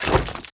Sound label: armor spin; smite; vulcan